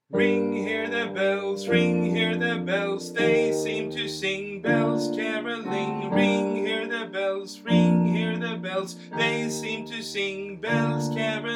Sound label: carol of the bells sop